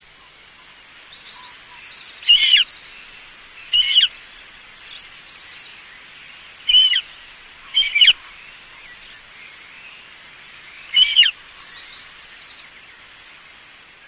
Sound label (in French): busard cendre